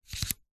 household radio aerial telescopic push down or pull out; zapsplat